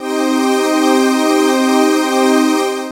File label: Fiunary C, Pads; Zero-G; Total Drum Bass